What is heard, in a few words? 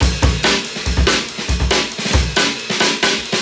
Riddim Drum Fill 140; Ghosthack; Riddim Essentials Volume 2